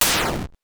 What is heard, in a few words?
explosion, 8 bits; Game Sound Solutions - 8 bits Elements